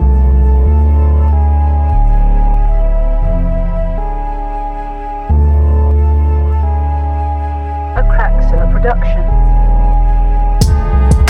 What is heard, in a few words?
Tuba